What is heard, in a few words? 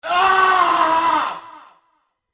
scream